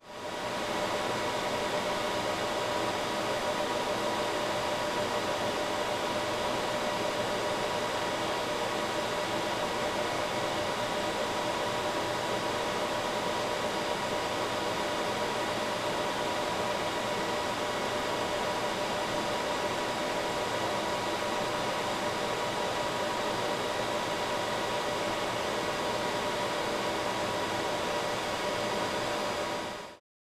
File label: Powerful Computer Industrial